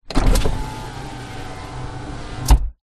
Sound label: car suv holden captiva electric windows both front down; transport